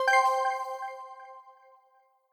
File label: money earned; Skillzgaming; gems odyssey 92